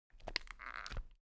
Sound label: object case spectacles open soft; smartsoundfx